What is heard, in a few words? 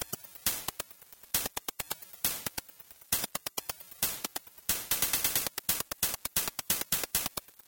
Game Over; Zoom!; Genesis